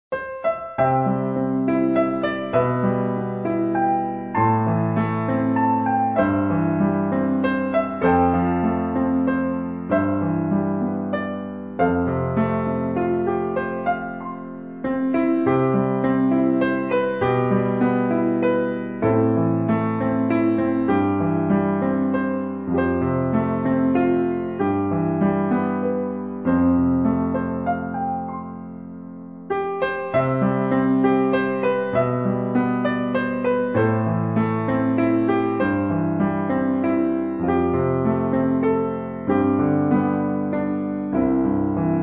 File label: piano